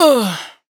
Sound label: "Ugh" Chop, adlib, Dry Vocals; Raw Vocals; Slate Digital Omnivox Vocal Sample Pack; Adlibs